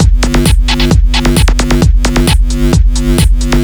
Beat 132BPM (Full), techno; techno drum fx

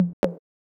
Tom, techno drum

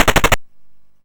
YM Heli Pad; SFX; ChipShop Deluxe; ChipShop Hits

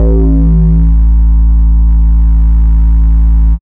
Bass B A1, drumnbass 170bpm; musicradar; Bass B Multis

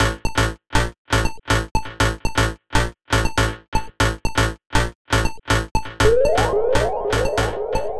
Synth A, 120 BPM; CHIPSHOP DELUXE; CHIPSHOP LOOPS

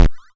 YM Kick, DRUMS

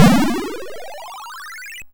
FX Bubbles; SFX; YM; ChipShop Deluxe; ChipShop Hits

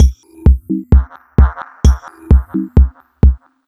130BPM Kick Perc, techno; drum fx; Beats

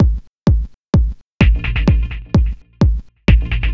128BPM Kick Synth, techno; drum fx; Beats

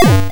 YM Arp G, chip; BASS; CHIPSHOP HITS

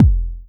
Jomox Kick; techno drum fx; Analogue DrumHits

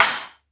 Jomox Cymbal; techno drum fx; Analogue DrumHits

